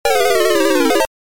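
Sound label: digdug disappearing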